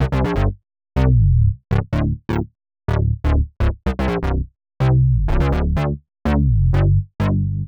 Bass synth C, 125, Nu Jazz House; PowerFX - Nu Jazz House

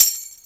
TAM; E-MU Formula 4000 Series Vol. 1; Hip Hop Nation; Bonus Perk

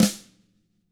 TAMA SNRD, Drums; ILIO; Double Platinum Drums 2

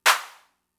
Clap, Fricke MFB-522, Drum Machines

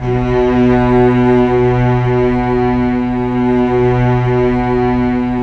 Symph; Roland L-CD702

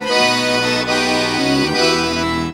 ACCORD HITS; Best Service; World Colours

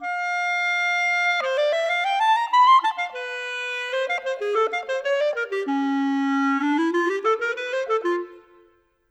Clarinet; Sonic Foundry (Sony Creative Software); Orchestral VOL-2; Modern 29

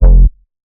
Bass F, Bass Synth

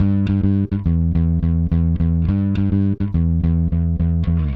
Clinical Bass; Sonic Foundry (Sony Creative Software); Indie Rock Anthems; Session 02 - Clinical

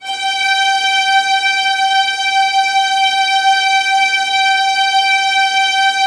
VIOLINS, Strings; ILIO; Synclavier Strings

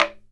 logdrum, percussion

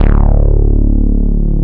OP BASS; East West - Global Sounds; THE CAT

